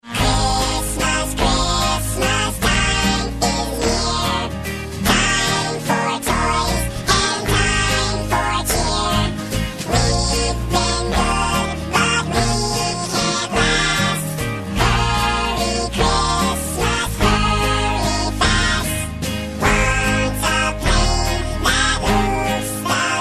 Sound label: funny christmas ringtone